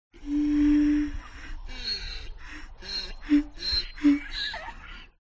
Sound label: DONKEY Bray Resonant; ANIMAL; smartsound